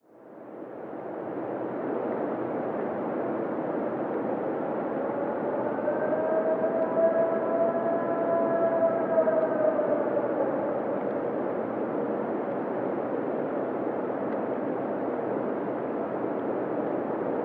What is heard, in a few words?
howl; Cuyahoga OH